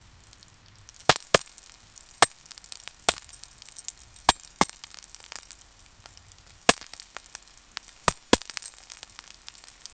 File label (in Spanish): lluvia de flechas golpean; arrows